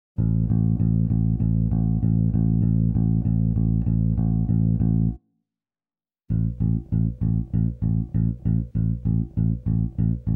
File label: legato staccato